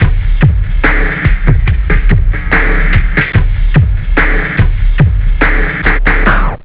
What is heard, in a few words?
break, loop, house